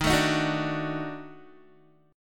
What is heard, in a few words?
Eb Minor Major 7th Flat 5th Eb down Guitar; EbmM7b5; strummed; JGuitar